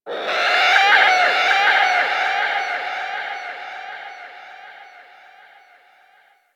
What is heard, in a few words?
Creature; Ambience; Warframe; Corpus Outpost